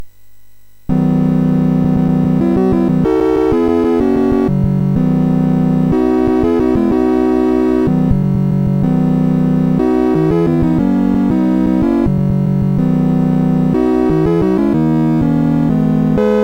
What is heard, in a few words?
sid MOS6581R3, GAMES; Flak